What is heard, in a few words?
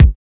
warm sounding kickdrum c sharp key